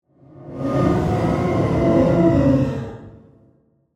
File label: Ghost Alien Howl, Ethereal; Horror; Ghost Sound Effects